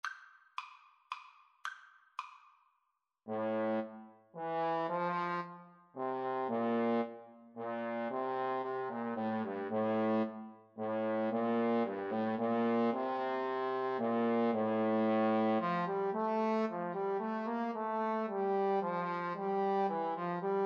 Trombone Duet

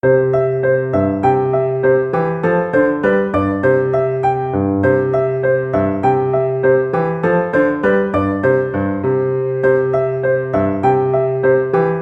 ecossaise piano; hummel

